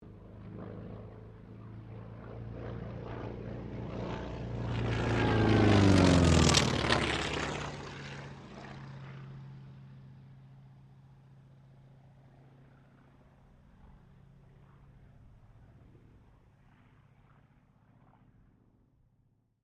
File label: AIRCRAFT T-28D TROJAN PASS; Airplanes (Sound Effects:Vehicles)